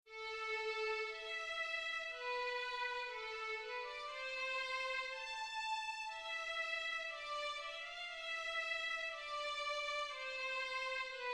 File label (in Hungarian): C-dur kanon vonoszenekarra